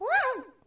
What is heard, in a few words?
woah; toadette; sm64coopdx; char-select-extra-chars